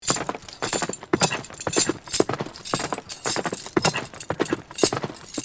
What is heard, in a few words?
Medieval knight on horseback armor clanking